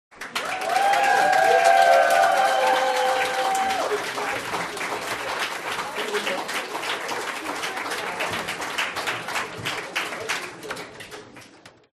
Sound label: HUMAN CROWD Applause Moderate Medium Audience Back; smartsound